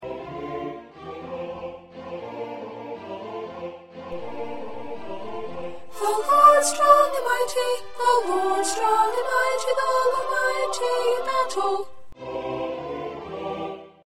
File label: 2nd Soprano